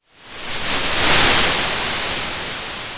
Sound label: Sound of waves crashing on a shore